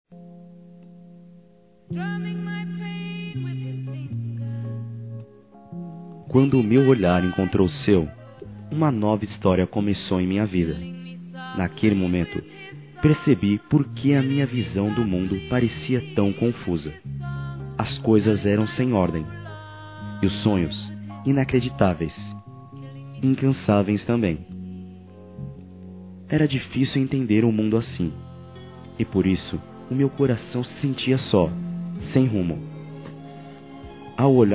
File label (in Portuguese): Masc, Conquistas Cantadas; Telemensagens 2017